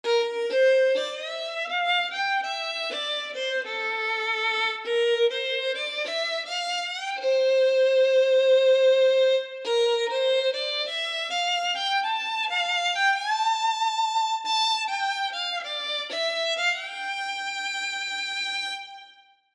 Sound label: violin